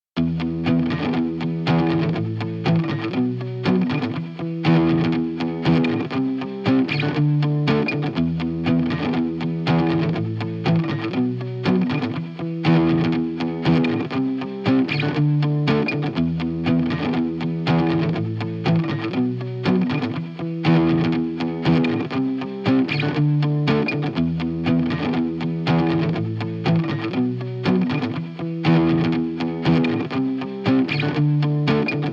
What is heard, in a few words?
Em 120 GTR rock motif